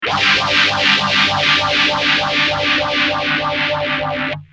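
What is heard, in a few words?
guitar, Intelliphase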